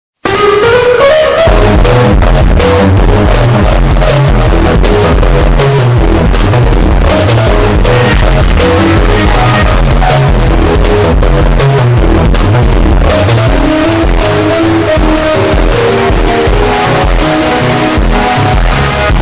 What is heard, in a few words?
bass boosted